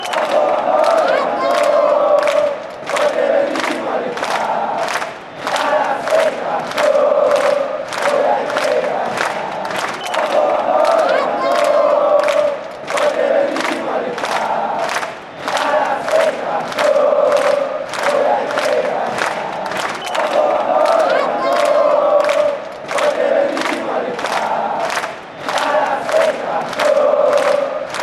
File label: para ser campeon chivas fanchants